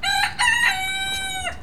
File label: Cockerel Small; Birds